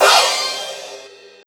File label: CYMBIRD; USB Soundscan vol.33; Disjointed Textures; AKAI